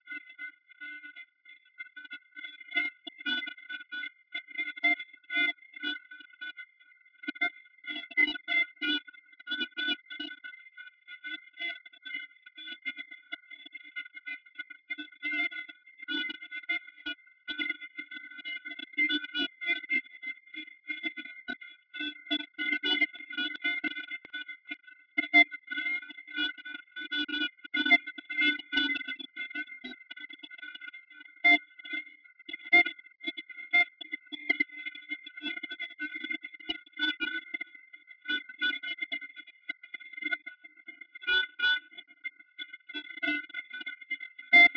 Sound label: Jazz